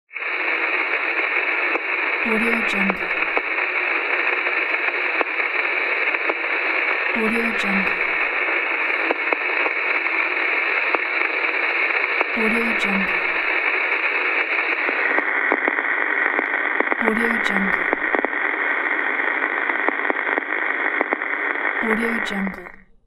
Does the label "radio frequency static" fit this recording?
Yes